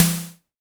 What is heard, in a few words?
polyvox snare